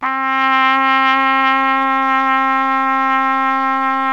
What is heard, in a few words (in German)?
TROMPETE C 2, TRUMPET; Best Service; World Colours